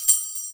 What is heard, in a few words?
SHAKER, INDIAN PERC